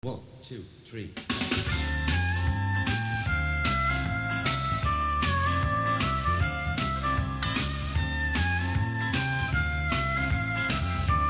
harmonica

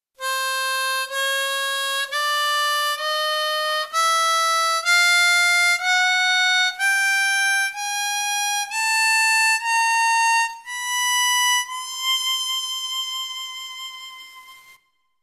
Overblow, harmonica

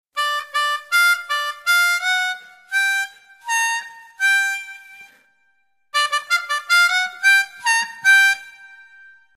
Overblow, harmonica

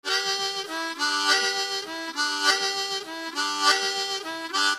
Tongue, harmonica

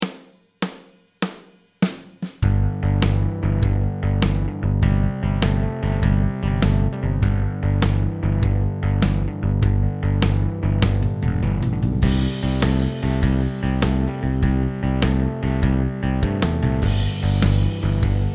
12 Bar Quick Change, harmonica

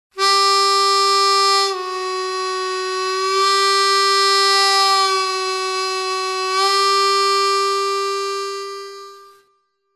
bend, harmonica

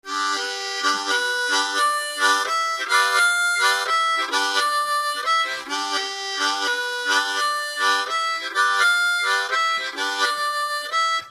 Tongue, harmonica

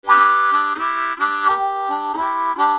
Effects, harmonica

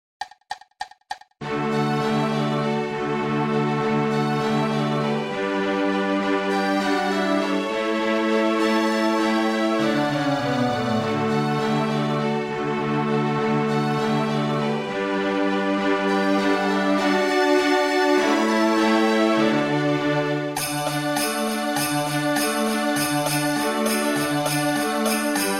jingle bells d, harmonica

